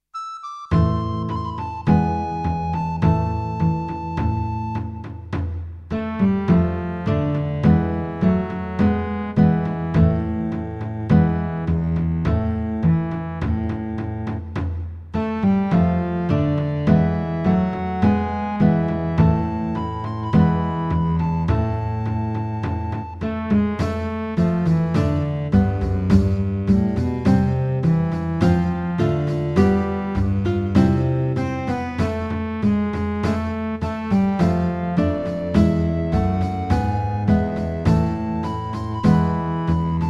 Bourree; Krieger; Meespeel CD

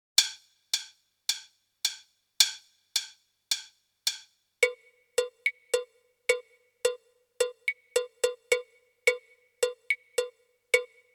Drum Solo; Meespeel CD

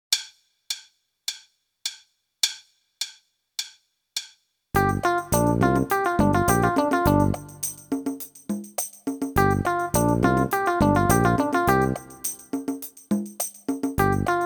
Paradiddle; Meespeel CD

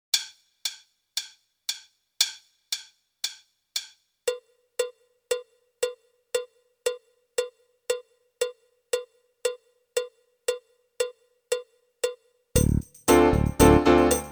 Medium Rock; Meespeel CD

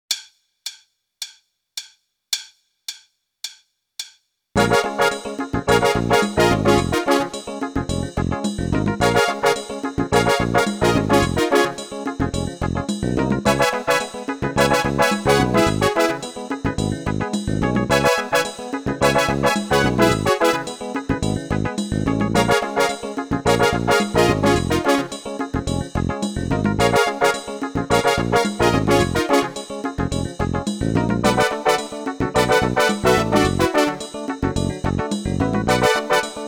Funky Rock; Meespeel CD

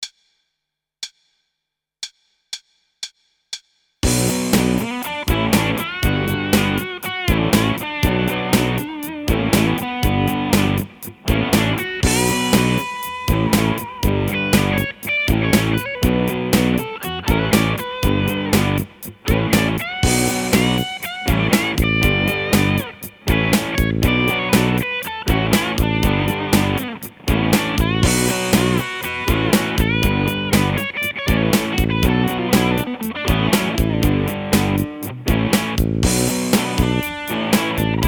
Grooves

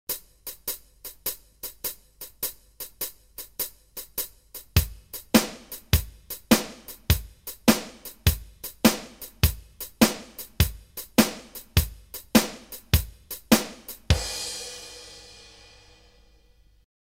Shuffle

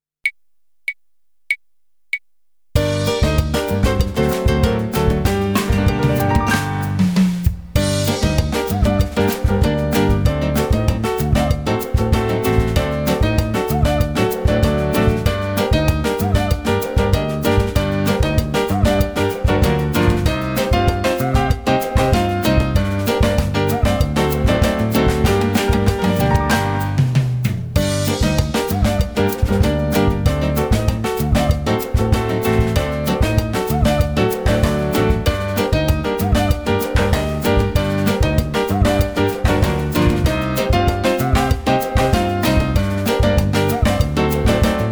Samba

